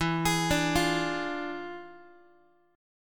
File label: E 7th Flat 9th E down Guitar 4A 4E 5A 5D 5G sharp 6C sharp; arpeggiated; chord; JGuitar